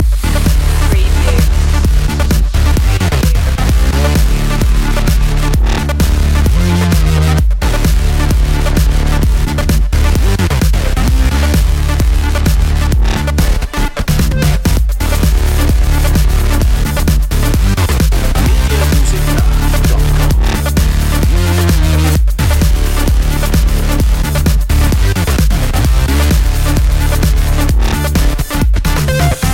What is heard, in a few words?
acid funk sandwich loop